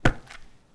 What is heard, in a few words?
Footsteps Concrete Asphalt Street